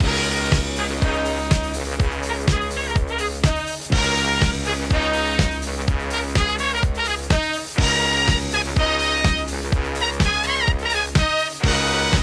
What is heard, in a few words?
Music Free Spins Loop